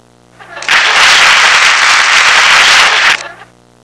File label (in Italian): applauso, applausi, persone